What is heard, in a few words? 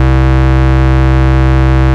Ultimate Bass Root C; Vengeance Samples